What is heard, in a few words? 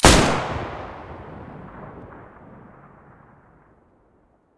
muzzle protecta stereo, weapons